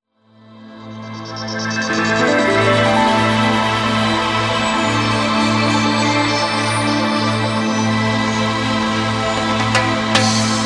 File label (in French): INTRO REGGAE COURTE